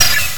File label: fire arrow; mazeofgalious